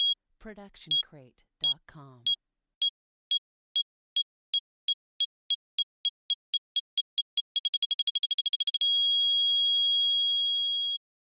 Bomb Beeps Increasing

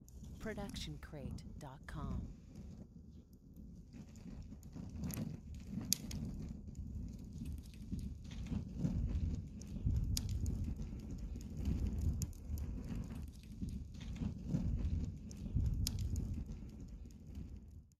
Outdoor Fire; SoundsCrate

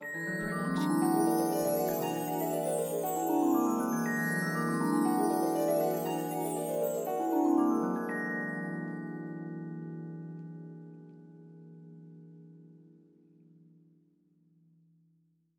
fantasy gliss; soundscrate

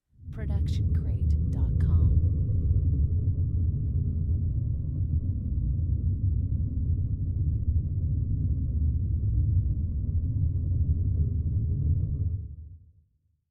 Scary Rumble; soundscrate

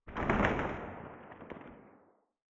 Explosion Blast; SoundsCrate

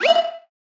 Motion Design Riser; SoundsCrate

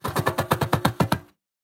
train going; soundscrate

